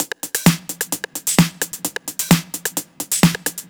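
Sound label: 808 No bass drum, Drum Loops 130bpm; Zero-G; Soundclash Nu Dance Electro; Acidized Wavs; 808 Land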